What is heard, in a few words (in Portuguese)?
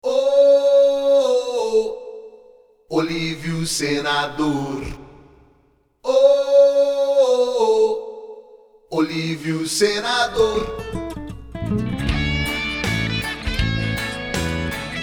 politico Jingle; Olívio Senador